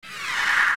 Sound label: Lurk; OOT; Poe Sister; Meg